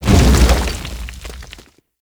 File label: Condemn Earth Crack; Warframe; Priest